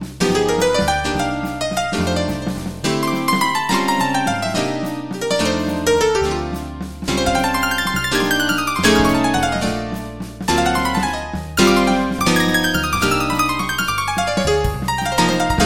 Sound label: Roland XP 50 Electric Piano; ElectriKeys; Syntheway